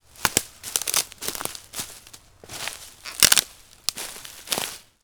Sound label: Footfalls breaking Twigs; footsteps